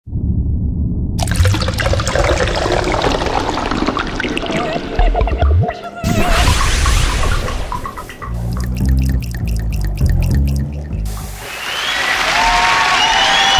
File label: jingle; zkb